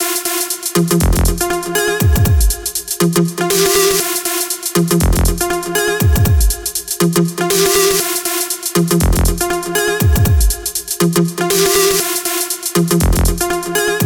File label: Chill Intro